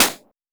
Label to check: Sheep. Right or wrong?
Wrong